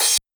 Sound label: Cym short